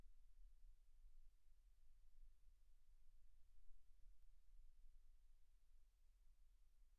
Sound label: silence 48kHz